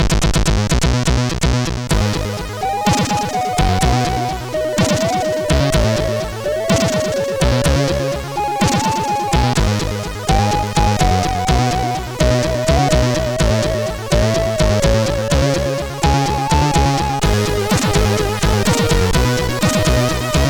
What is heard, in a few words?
Instrument, CSG8580R5